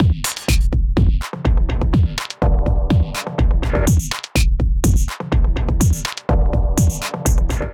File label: Main Room Kit 124 bpm; Ableton; Punch and Tilt